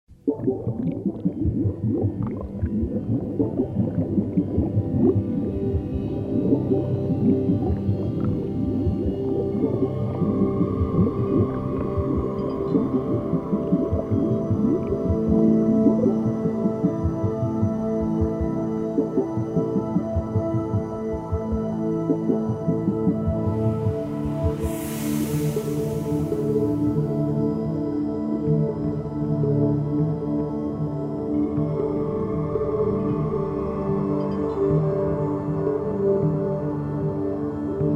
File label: Ambient